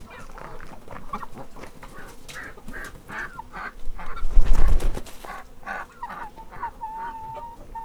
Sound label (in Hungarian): arrébb repülő galamb baromfi; parasztudvar